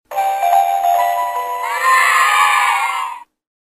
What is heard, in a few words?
funny text tone